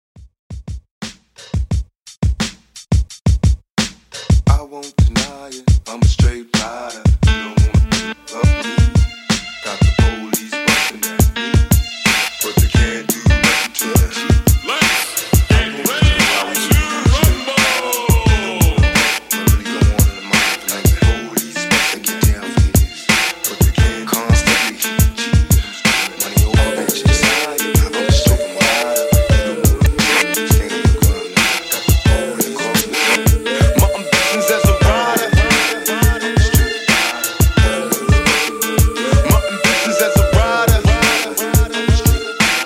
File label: Old School Redrum 87Bpm